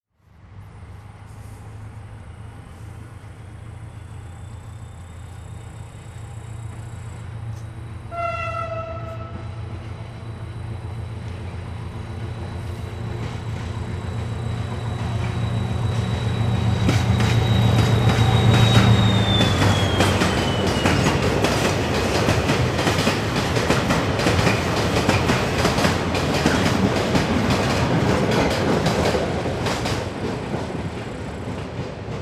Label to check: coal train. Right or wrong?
Right